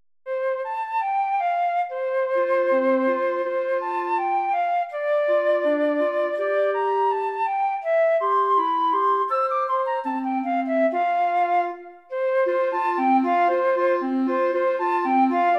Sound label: jingle bells; pierpont james; the one horse open sleigh